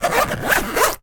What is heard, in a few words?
office zipper stationery pouch